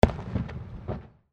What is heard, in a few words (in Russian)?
vesennij festival ezhegodnij fejerverk vzriv zvuk